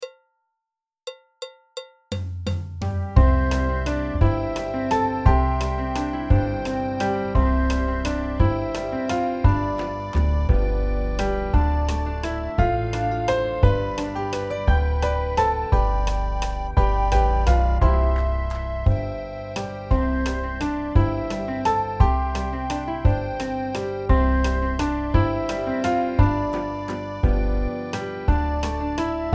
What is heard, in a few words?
Westphalia BACKING TRACK, waltz folk song